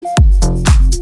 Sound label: sms